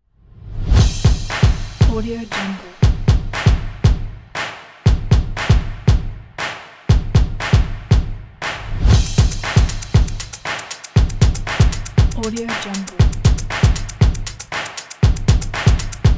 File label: stomp intro percussion